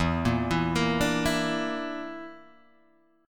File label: Bb Major Flat 5th E, chord